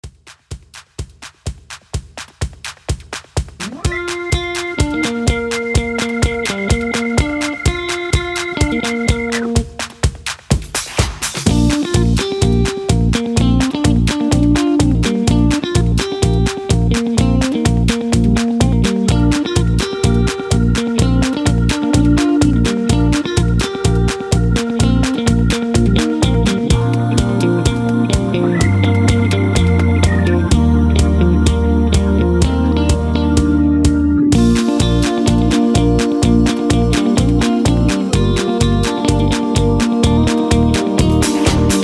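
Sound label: Instrumental with Melody with background vocals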